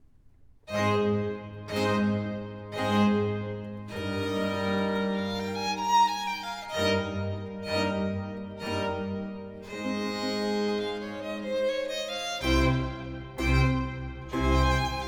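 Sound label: Sonata in D Major, Grave, Trumpet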